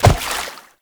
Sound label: Step Water, Movement; Uriel; Warframe